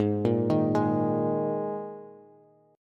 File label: Ab Suspended 2nd chord, Bass; arpeggiated; JGuitar